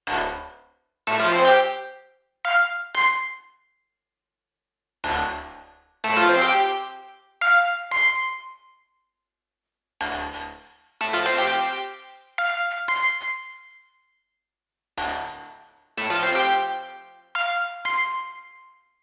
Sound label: cab reverb 480 modal; logidy epsi